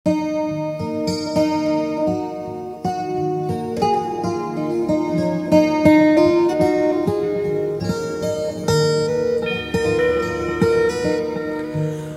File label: Taras Mantra Meditation